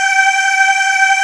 Aahs A3

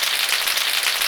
Applause

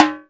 Timbale Strike

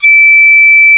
Piccolo F#5

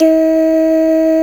Doo G2

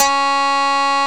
Strat F2

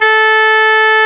Oboe C#3

